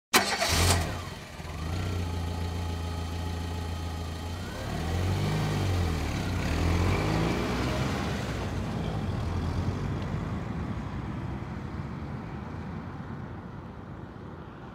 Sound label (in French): Bruit voiture qui demarre; le lexique des moyens de transport